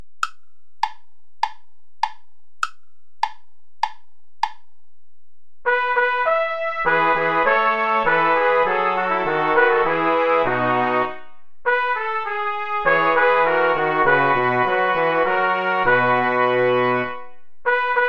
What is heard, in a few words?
play along without trombone; bach johann sebastian; god when shall perish